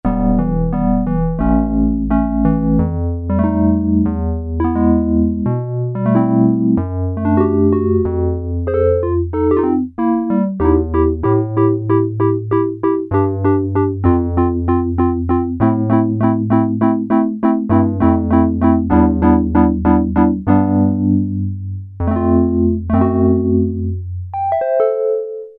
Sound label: Electric Piano, Korg PolySix; Factory Presets Bank A